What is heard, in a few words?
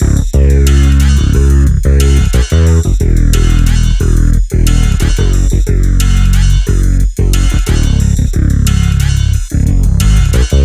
Bass